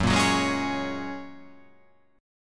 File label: Gb Major Add 9th, Gbadd9 chord; strummed; JGuitar